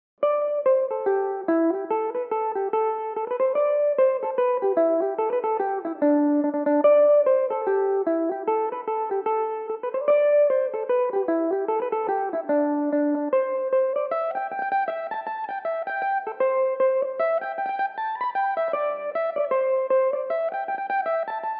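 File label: D mixolydian, irish mandolin, tunes; sporting days of easter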